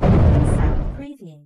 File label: boom; BALLY; TWILIGHT ZONE; Pinsound